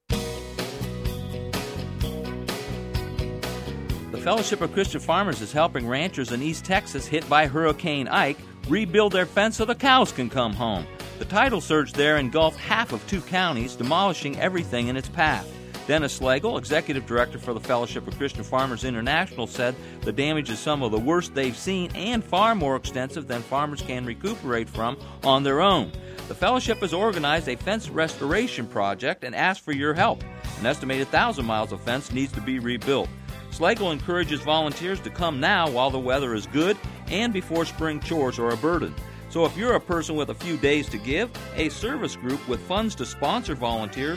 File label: 60 with music; 60 second with music; Hurricane Ike Response